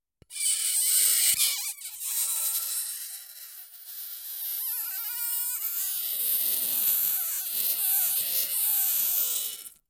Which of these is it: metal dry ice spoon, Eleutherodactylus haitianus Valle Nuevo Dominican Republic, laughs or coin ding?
metal dry ice spoon